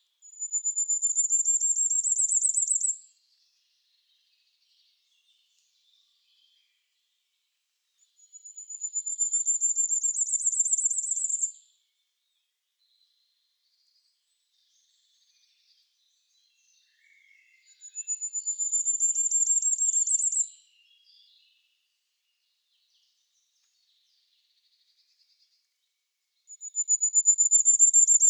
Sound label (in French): Roitelet triple bandeau Regulus ignicapilla Chant typique